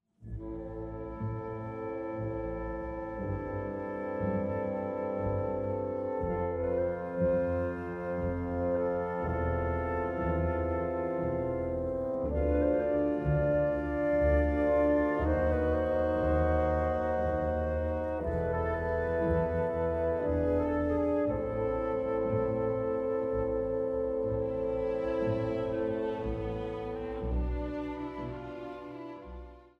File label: piano